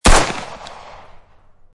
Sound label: gun shots from a distance